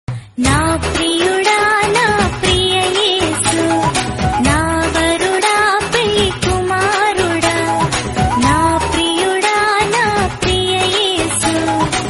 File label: telugu christian song